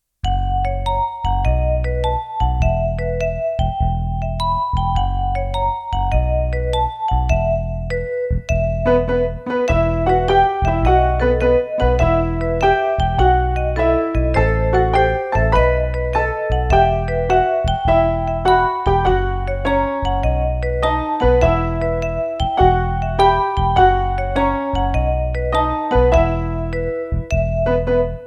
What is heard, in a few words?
zonder zang